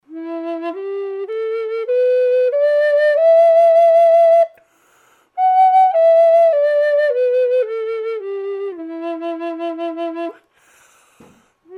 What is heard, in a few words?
em side blown tiger maple dry; flutes